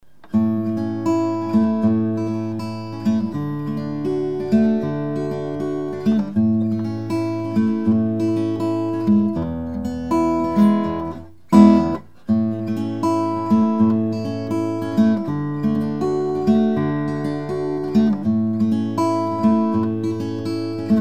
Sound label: Guiter, om guitar; Peter Coombe Mandolins and Guitars